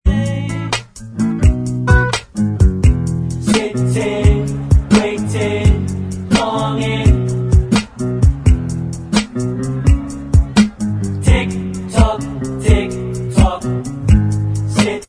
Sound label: Bvox Track